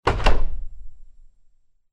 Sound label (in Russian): hlopok dveri v kvartire